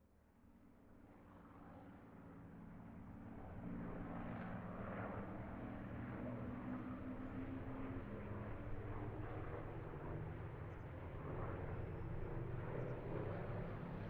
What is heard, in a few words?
Spitfire Pass; B-format; John Leonard; WXYZ